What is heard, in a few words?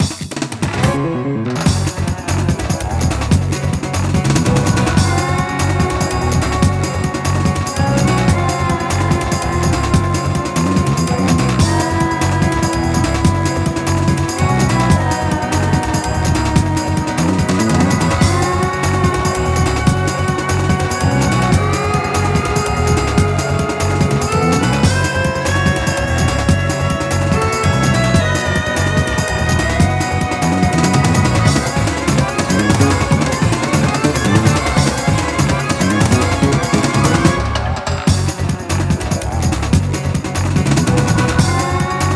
Musical Pads, Conveyor; Collapse; frogger s adventures the rescue; gamecube